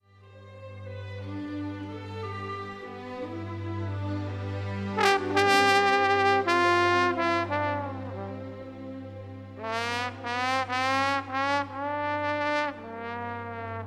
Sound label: Blues (Largo); Berlin 1986